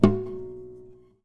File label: Classical Guitar Extended Technique Plink